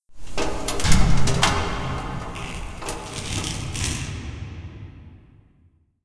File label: mall random, ambient